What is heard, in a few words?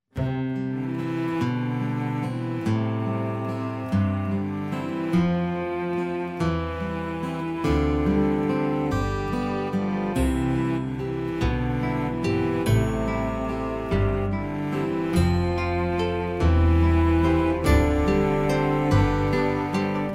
Simple Melody